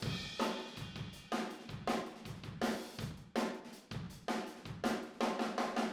Fill Room, Drums, 162 BPM; Drums from the Big Room The Mixes; Take Your Toms Off

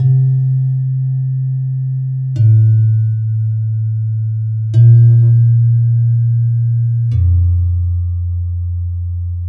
Pelog Slab Gong Bass; Melodic; Ambient-Melodics-II

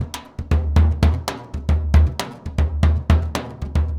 SURDO, DRUMS SAMBA; Best Service ProSamples vol.14; AKAI

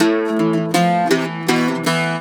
Celtic Flavours; USB Soundscan vol.42; AKAI

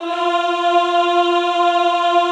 EHH F3, Classical Choir; Best Service

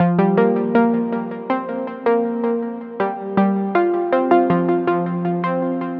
80 Fm Blossoms, Melodic Loops; Electronisounds

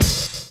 DRUM CHOP; AMG Gota Yashiki Groove Activator

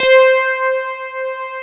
FM ELP 8 C4 L, Keyboards Old School; USB Soundscan vol.09; AKAI

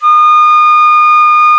D#5 FLUTE; AKAI S-Series CD-ROM Sound Library VOL-7

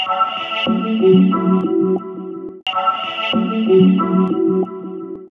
Ice clouds, Pads; Sonic Foundry (Sony Creative Software); Synchro Funk

